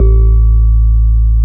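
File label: Piano Rhodes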